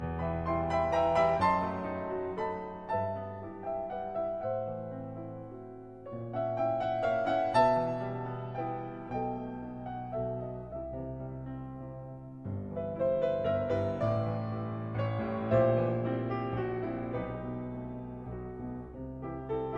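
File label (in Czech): Slovanský tanec č.10 e moll Allegretto Grazioso, varianta pro čtyřruční klavír; (Mazur) op. 72; Antonín Dvořák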